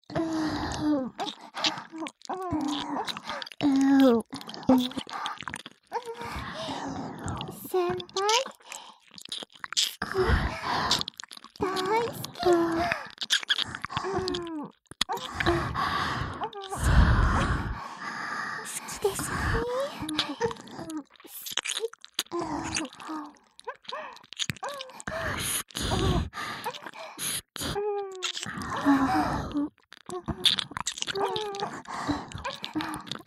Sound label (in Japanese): しゅきしゅき囁き両耳舐め3WAYループ; 通常耳舐めver